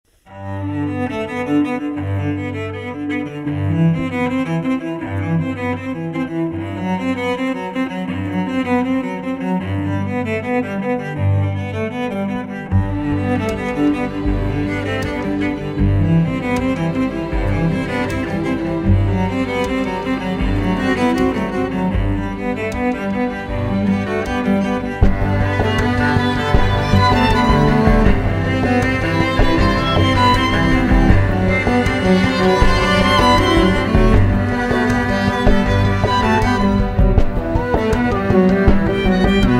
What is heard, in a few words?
cello suite in g major violin; Bach Cello Suite No. 1 in G Major BWV 1007; Symphoniacs